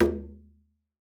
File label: Talking Drums, EMU E-III; Retro Sampler